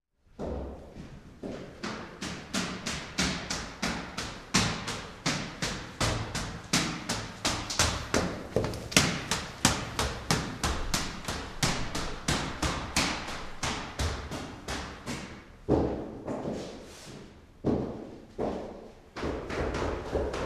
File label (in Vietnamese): Tieng buoc chan chay len xuong cau thang